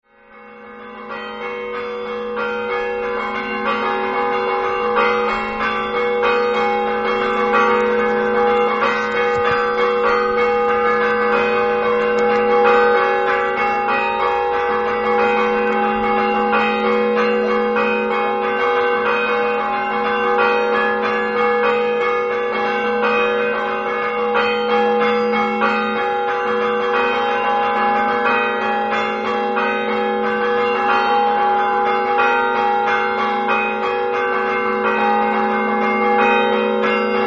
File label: campane